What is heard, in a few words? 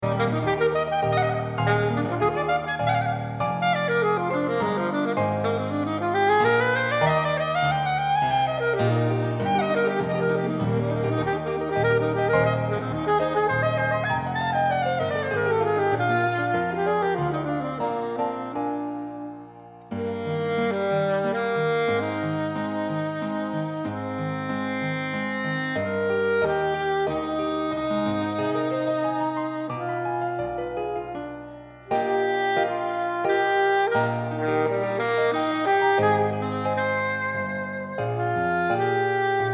alto